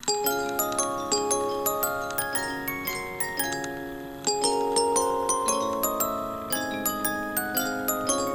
Boxy Jingle

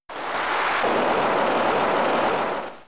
Hydro Cannon; Pokemon; Gen 3; attack moves; RSE

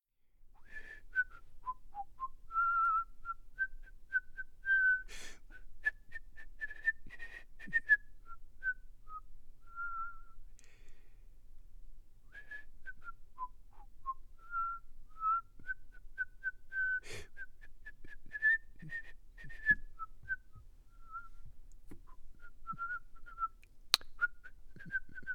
Foley Whistle Grand old duke of york

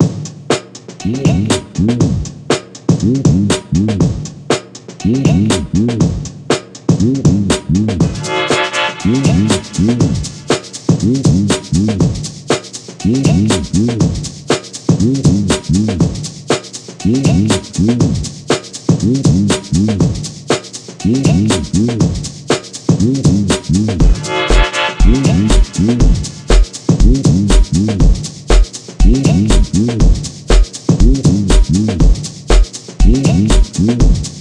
Attic; Frogger in Toy Town

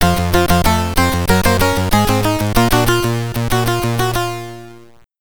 Round Clear! (SID Stereo); Gem X; Commodore 64

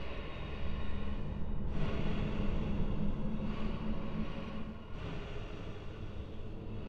Ambience Dungeon

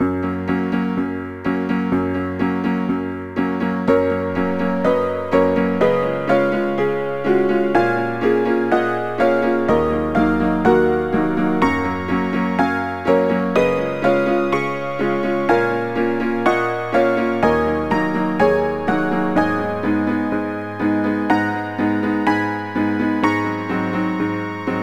Dance Chachacha Fast; knigge spielend zum guten benehmen; nds

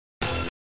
pickup tone

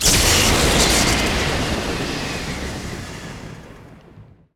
Warp Pod Light; Wario Land: Shake It!

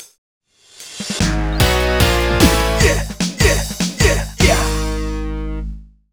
Big Rock Finish; Rhythm Heaven; NDS

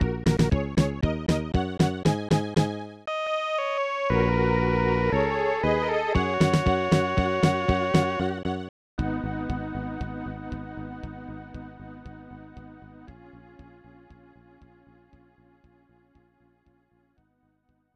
Enka; Shin Megami Tensei if...; SNES

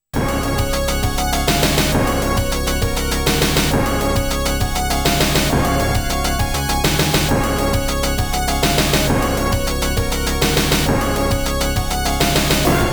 Countless Stars YM2151; Cho Jin; X68000

